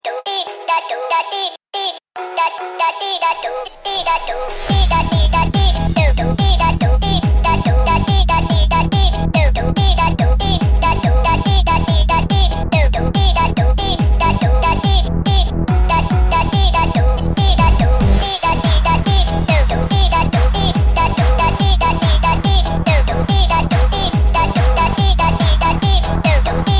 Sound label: Remix